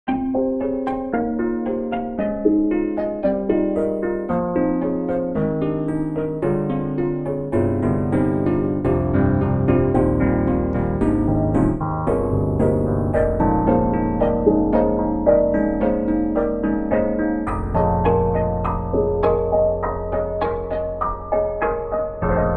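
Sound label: Broken Piano; BlokenLanguage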